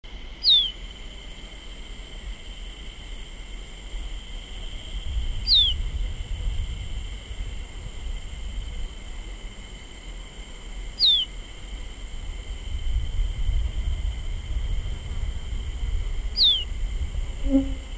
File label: Sporophila plumbea; PLUMBEOUS SEEDEATER; Calls by male; recorded Aguara-Ñu, Mbaracayú Biosphere Reserve, Departamento Canindeyú; Juan Mazar Barnett December 1997